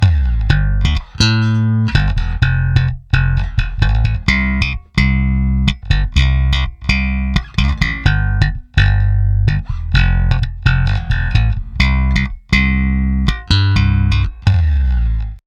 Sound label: slap bass comp EQ; WHITE CHANNEL